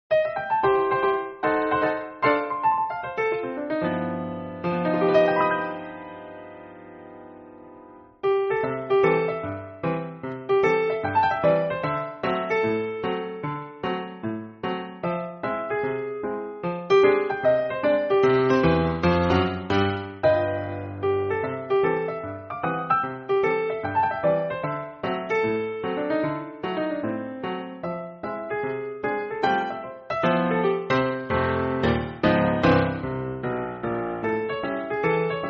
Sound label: music; dino